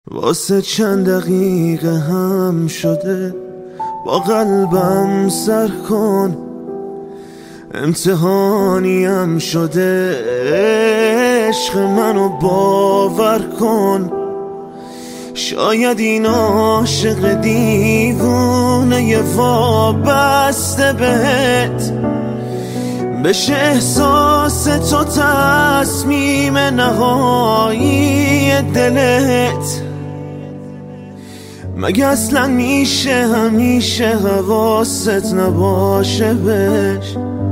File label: Piano Version